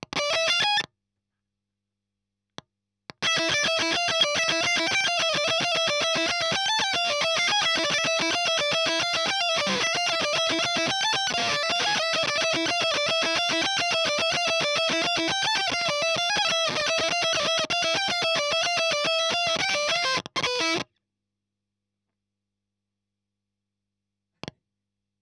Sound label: EL Fuzz End